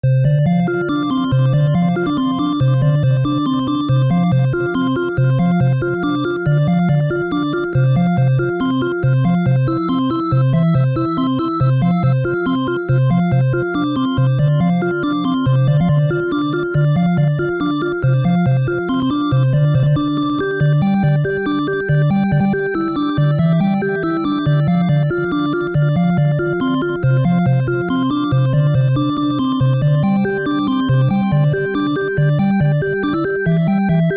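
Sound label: keyboard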